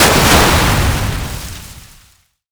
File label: crash